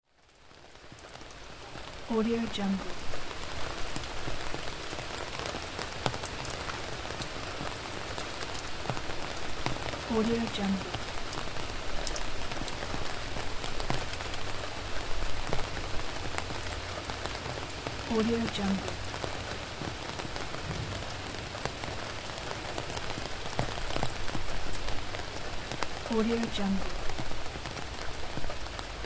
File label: rain on umbrella